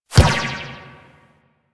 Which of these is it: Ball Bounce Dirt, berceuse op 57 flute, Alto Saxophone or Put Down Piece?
Ball Bounce Dirt